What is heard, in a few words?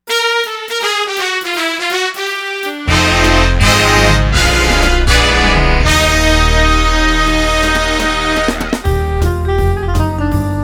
big band minute